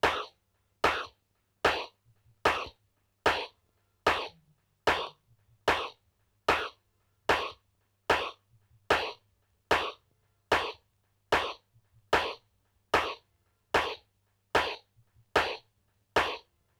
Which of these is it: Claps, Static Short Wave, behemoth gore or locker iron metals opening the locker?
Claps